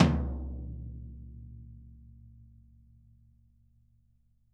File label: VINT KITS; Sonic Reality - Vintage Timetraveler